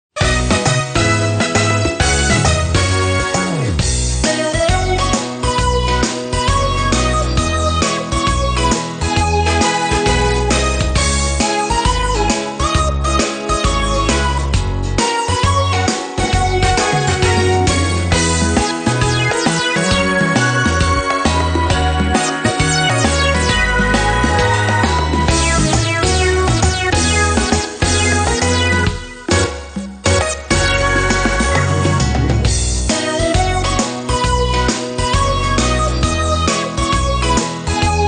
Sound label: Music Success event